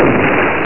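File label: Door Close; Sound Library 2000; Wayzata Technology; Household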